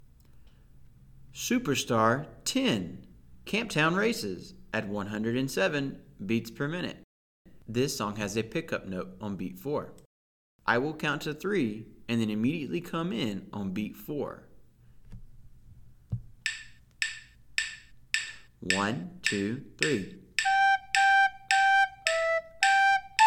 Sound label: 107 bpm; Camptown Races; Be A Recorder Star Songs